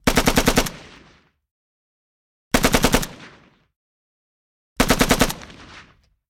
AK47 Long Bursts Dual

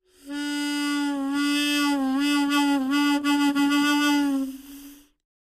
Mouth Organ, harmonica; woodwinds

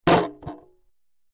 Wood Shield Falls Woo, shields

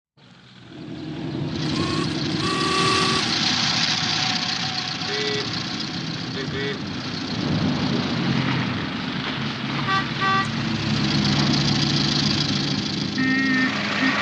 Historical Traffic, 20s & 30s

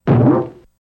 Boink Drum Quality

